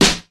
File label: sharp steel snare drum f key; snare-drum-samples